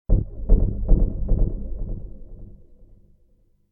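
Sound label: orchestra creepy melody in space low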